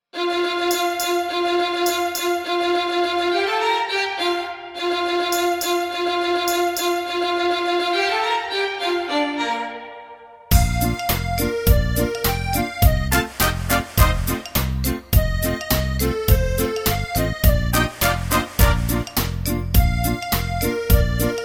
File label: brass minus